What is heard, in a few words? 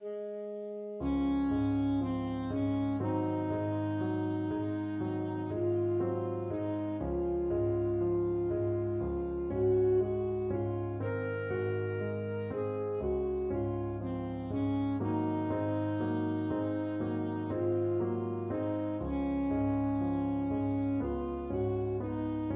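etude alto saxophone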